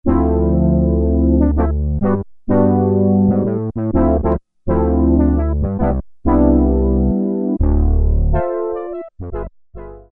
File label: Fanfare Brass, Roland Juno-60; Factory presets; Factory presets group 2